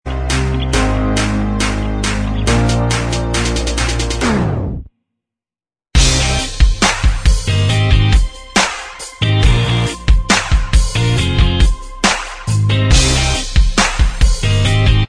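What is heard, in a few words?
instrumental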